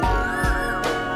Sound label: bird kuyil; animals